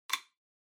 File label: Wall Outlet Cover Key Remove